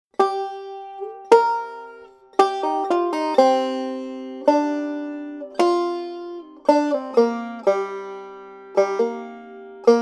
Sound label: Melody; Little Sadie